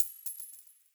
Coin Drop

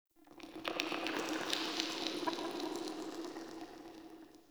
Magic Cave Texture, Ambience; Midrath Island; Fey Hub

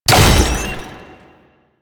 Scan Impact; DE; Warframe; Frumentarius

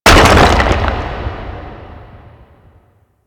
Rock Stairs End; Ambience; Orokin; Warframe; Orokin Moon

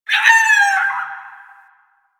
Creature

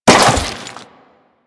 Crewman Step Flesh, Footsteps; Warframe; Corpus

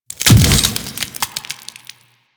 Brain Cyst Explosion; Warframe; Infested Eris

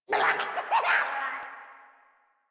Death; Grineer Jester

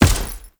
Heavy Step Default, Footsteps; Warframe; Tau Dax

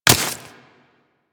Staff Grab Idle C; Warframe

